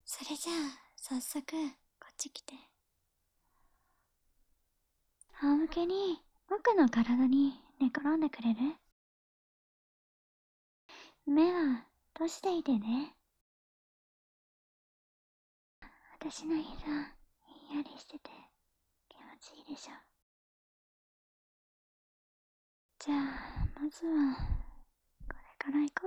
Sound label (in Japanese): ふわふわスライム, ASMR